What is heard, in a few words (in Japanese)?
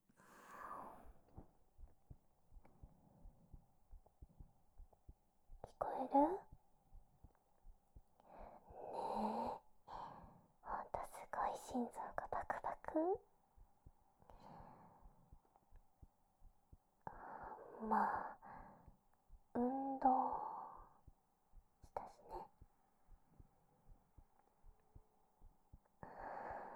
心臓 ドキドキ おやすみ チュッ, ASMR